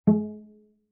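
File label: Cello pizz fortissimo sul C Ab3; MIS Pitches - 2014; Strings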